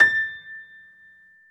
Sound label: piano A5; AKAI S-Series CD-ROM Sound Library VOL-8